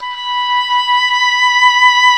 WND OBOE2, Oboe vib

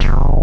PULSE Q BS, TECHNO; Pro-Rec - Synthsonic; Z1 TECHNO

